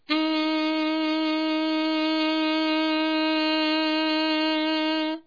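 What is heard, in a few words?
saxophone single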